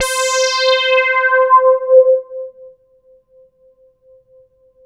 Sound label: P800 FD C5, Korg POLY800; Back In Time Records; Korg Universe VOL-1